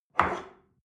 espresso grab from table slide soft, restaurant cafe